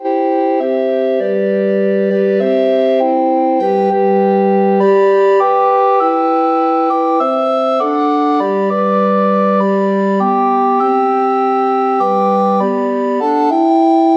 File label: rec ens, recorder quartet